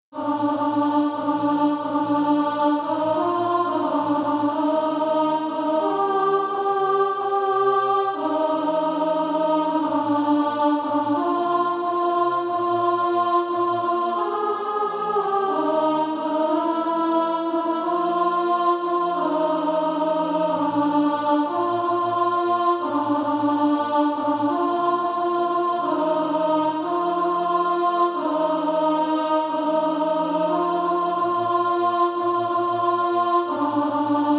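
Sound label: alto, Practise Music; SATB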